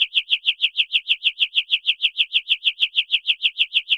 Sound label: Chirp FX DX100 As3; birds